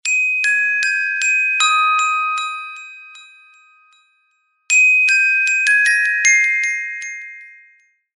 Glockenspiel; HTC HD7

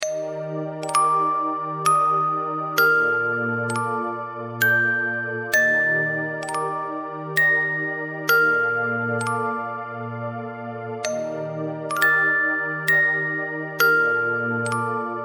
Orgel; LG; To the Dream

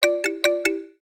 crisp, notifications; ZTE nubia Z20

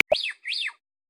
Whipbird; Xiaomi Redmi-Note-8-Pro

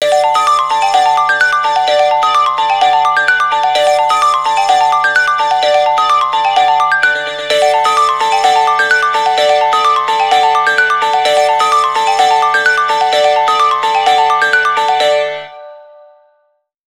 Traffic Jam; Sony Ericsson; PlusFourSix; Lars Edvall

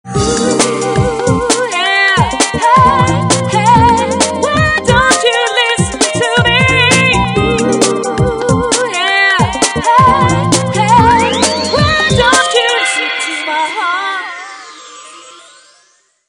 Beats, phonetones Motorola; V197

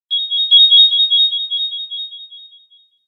Peal, Nokia Ringtones; 7210 Supernova

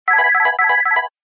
Serene tone; Samsung; SGH-U800 SoulB

